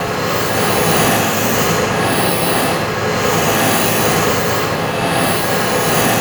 TURBULENCE; Spectrasonic Distorted Reality 1

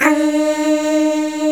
UMM D#3, POP CHORUS

